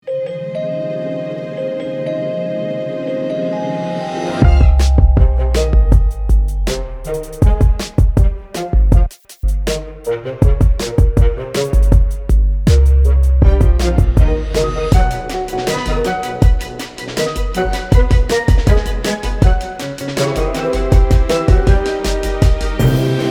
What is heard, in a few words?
Lullaby Baby (R&B groover, more punctuated)